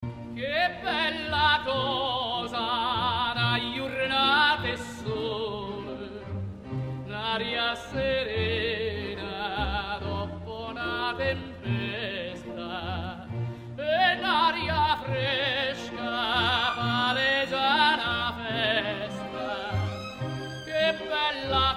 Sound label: Tenorino; O Sole Mio